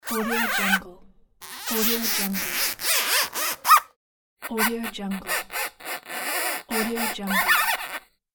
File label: balloon toy